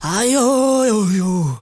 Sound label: MALE SOLO, Heart of Africa